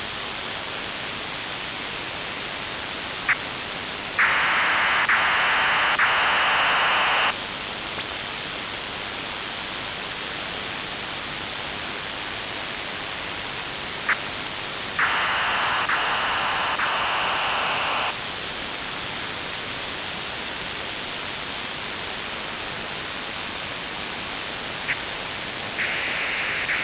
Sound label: psk-4 br2400; acf375 ms